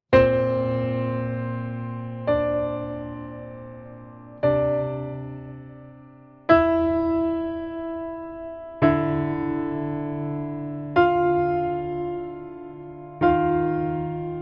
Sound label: PIANO